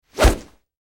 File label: Medium Speed Air Whoosh